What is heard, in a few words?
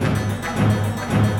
ORCH; MELODICS